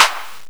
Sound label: soft hitnormal; osu